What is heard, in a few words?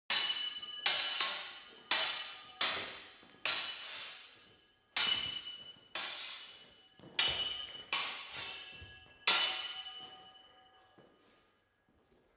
sword hit sword sequence